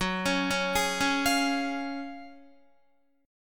Gb Major 7th Suspended 2nd, Guitar; GbM7sus2 arpeggiated; JGuitar

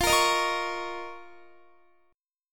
F 7th Suspended 4th Sharp 5th F down Guitar; F7sus4#5; strummed; JGuitar

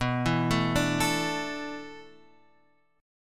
D Suspended 2nd B; arpeggiated

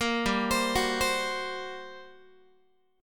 G Minor Major 11th, G, Guitar; GmM11; arpeggiated; 13,15,15,16,16,x

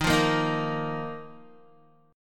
D sharp Suspended 2nd, Guitar; strummed; JGuitar

